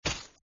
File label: hit; ArcherWorrior